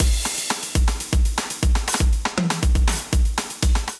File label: Kit, Drums; Atlas; Ableton; Factory Packs; Vibrations by ModeAudio